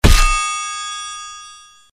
sword heavy flesh; conan; special

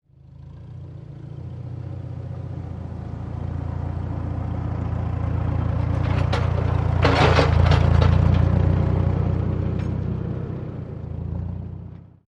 Tractor Drive By

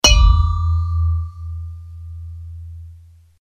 sword deflect stone; conan

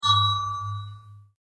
ringoff sword

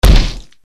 axe heavy flesh; conan

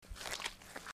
step mud; Mucky Muck, indeed! The sound of squishy-ness!; North woods of Wisco

